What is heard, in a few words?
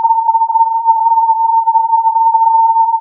filtered input